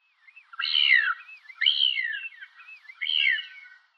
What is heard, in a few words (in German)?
Elfenbeinmoewe Pagophila ebumea; Vogelstimmen